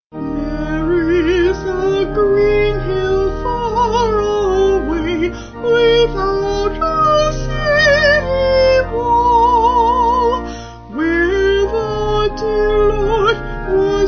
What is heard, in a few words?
There Is A Green Hill Horsley Vocal Organ; Vocals and Organ; Sung Lyrics